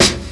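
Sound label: snare break, Yamaha Motif ES drum machine; 300 Drum Machines; Yamaha Motif ES-Series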